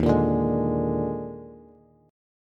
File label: D sharp Major 7th Suspended 4th, D sharp, down, Bass; strummed; D#M7sus4; JGuitar